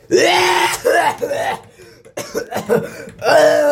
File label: cough